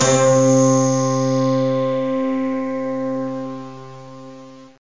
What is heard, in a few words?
digi-syn, keyboards; Zoom - Release 2; Active Software